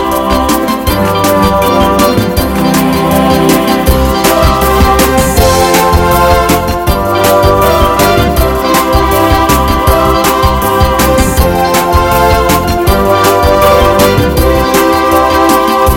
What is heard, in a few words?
Happy Fast Dancing